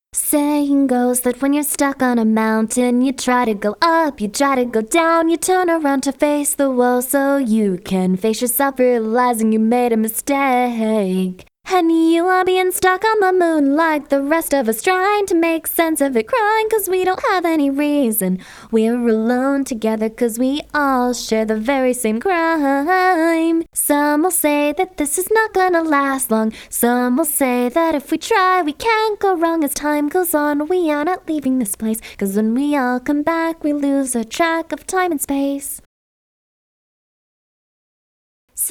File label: Vocals 163Bpm